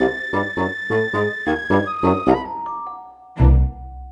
Spooky Tuba; the simpsons hit run; ps2

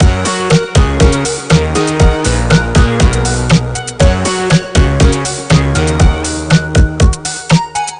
Music Dance; j4g a girl s world 2009 nds

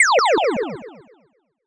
general Gameover; Slime-san Blackbird's Kraken

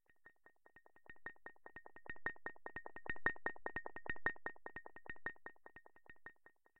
Taps

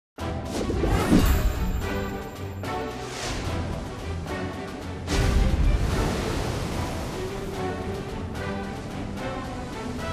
Pirate Ship; Disney Infinity

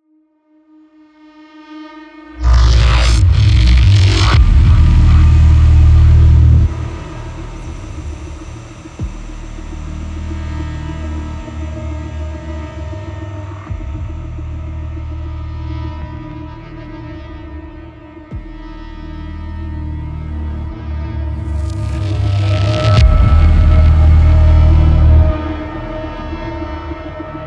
Combat Suite Main Ambient; Super Gore Nest

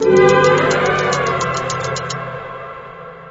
Game Over; Snowfight

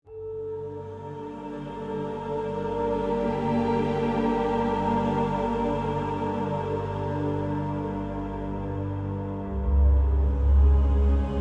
Amb End Soft; Amnesia: The Dark Descent; Android; PS4

